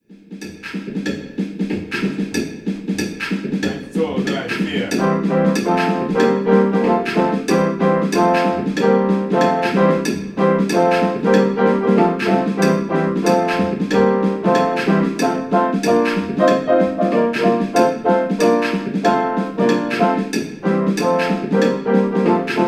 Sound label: swing melodie